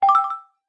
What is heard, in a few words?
Main Menu Letter; Select a letter for your name (Recorded)